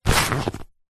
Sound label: paper glossy thick pick up; foley; zapsplat